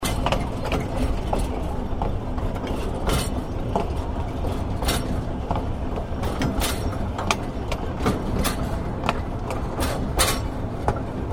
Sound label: ambience train cargo trucks loop